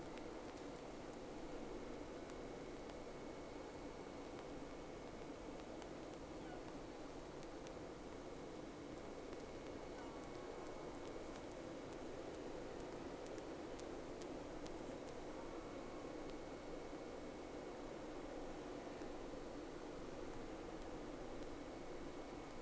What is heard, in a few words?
Whales; SeaSnake